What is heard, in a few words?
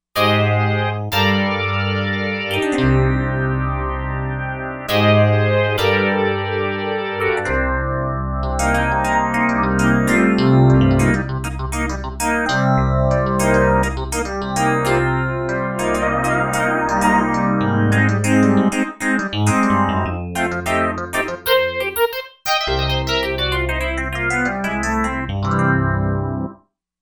Funk DX